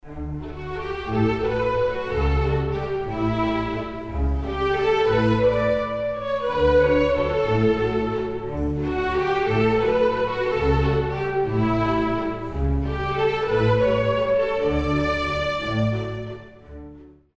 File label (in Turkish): santral fon muzikleri